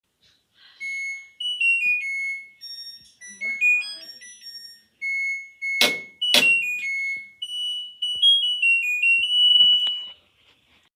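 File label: end alarm, washing machine; زنگ تمام شدن ماشین لباس شویی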